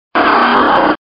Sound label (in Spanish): Grito; Marshtomp